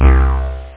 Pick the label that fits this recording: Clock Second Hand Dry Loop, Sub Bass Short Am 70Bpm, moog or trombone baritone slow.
moog